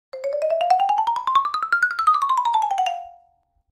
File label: Xylophone Lng Up Down, mallets xylophone